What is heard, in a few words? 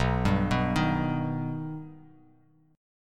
B Augmented B Bass Standard, chord; arpeggiated; JGuitar